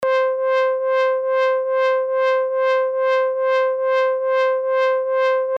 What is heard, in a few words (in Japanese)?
ふぃるたーLFO